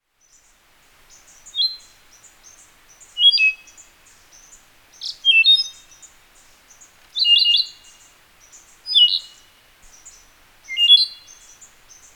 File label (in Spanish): Solitario Andino Myadestes ralloides